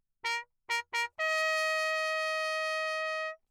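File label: trumpet mute Bach signal; Perinétventiltrompete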